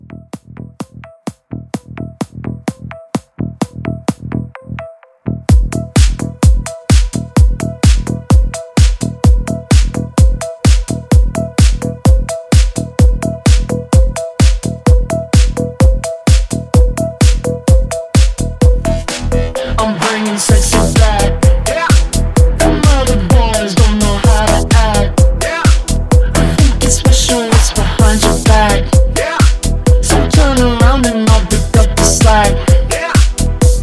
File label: House Mashup, 128